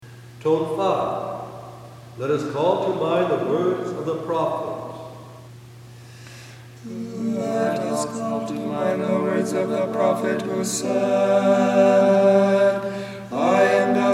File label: Stichera Tone 8; PARASATAS (TEACHING)